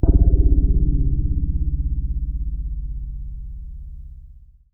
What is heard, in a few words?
C1, Synth Line; 90 s Sample CDs; NiceBeats; SynthLine VOL-32